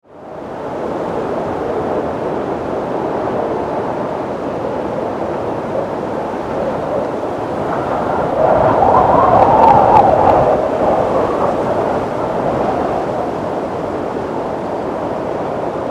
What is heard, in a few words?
Wind, Storm; 5.1